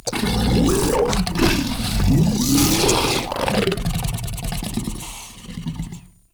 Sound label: Ancient Tank Idle, Enemies, Infested, Barks; Warframe